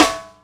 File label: punter, snares real